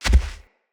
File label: Gallop Default, Animals Foley Steps; Bromius